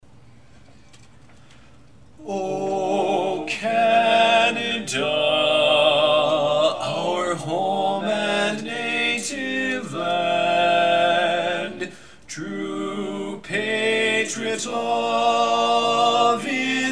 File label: o canada lead dominant